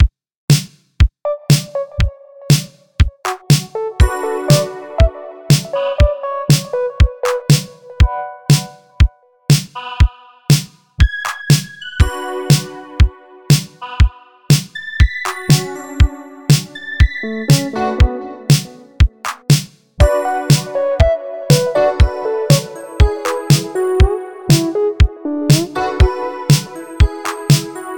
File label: synth wave